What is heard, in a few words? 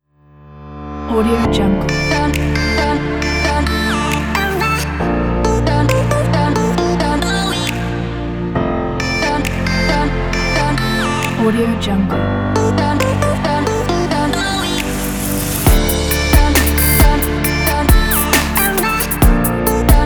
timelapse lounge; soundcreator